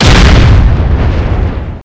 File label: step